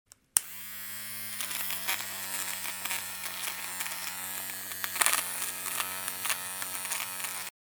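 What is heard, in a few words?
sound of trimmer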